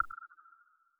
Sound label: clickkk, Closed Hats